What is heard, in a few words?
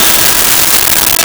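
Steel String Guitar E5; Nintendo; July 2020 Gigaleak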